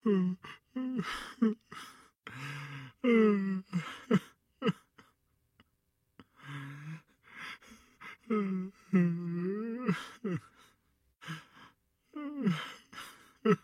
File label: Docile Loop; SCP-096